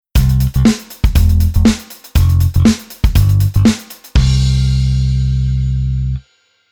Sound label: bass rhythm no synco